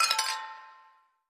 Toasting Beer Glasses

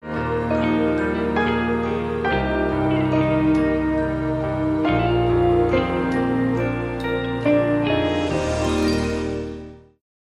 Rock a bye Baby

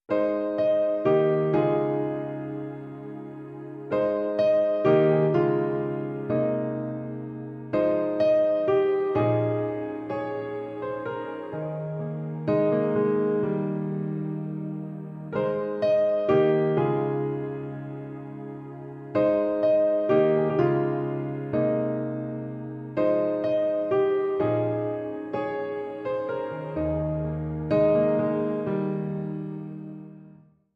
Solo Piano

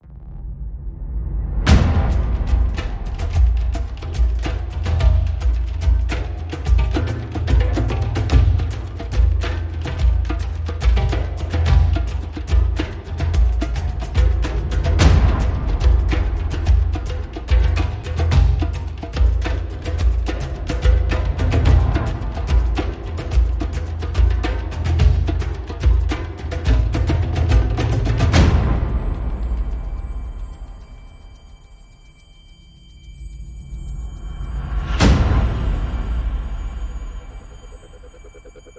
No Pads

